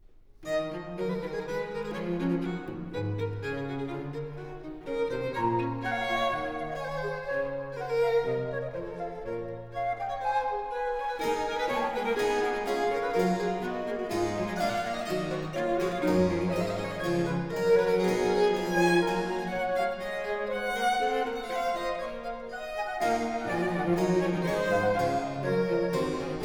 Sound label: flute, violin, cello & continuo; Quartet; Tafelmusik III/2; TWV 43: e2; Telemann